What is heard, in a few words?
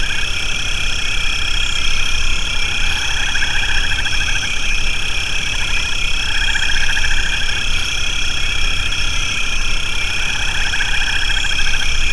Amb Swamp